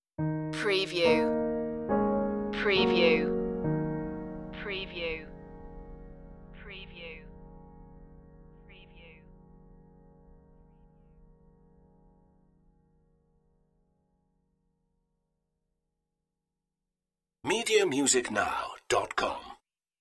dark wood piano

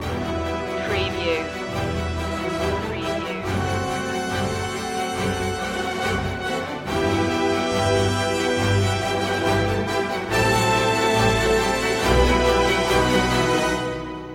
no choir no perc

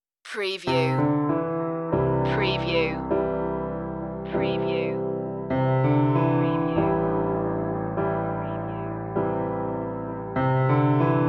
piano song

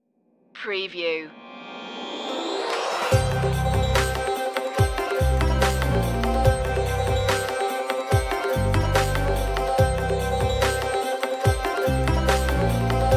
indian chase

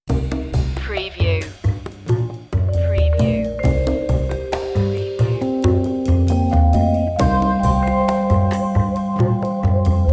jazzy opener underscore

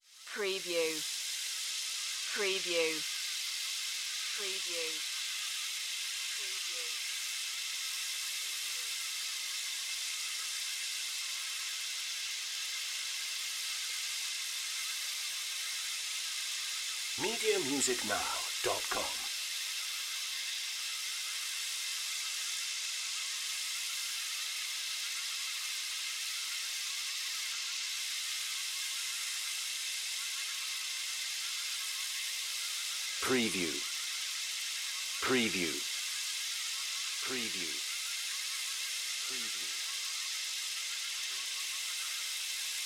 Ambience Jungle Night

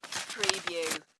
Envelope Letter Insert; Sound Effects Bible

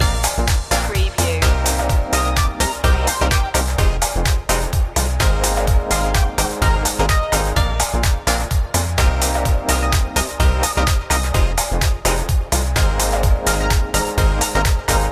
stash up loop

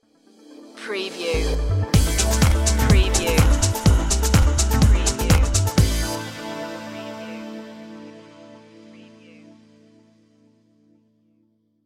shape shift stinger

